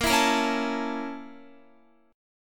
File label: Bb Minor Major 7th Flat 5th Bb down Guitar; BbmM7b5; strummed; JGuitar